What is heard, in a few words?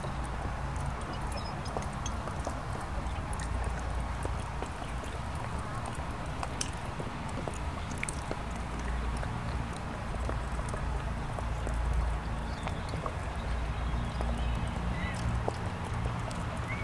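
sound atmosphere of the city, rain and street noise; Амбиенты (лес, город, помещение)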